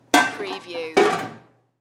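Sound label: Kitchen Pots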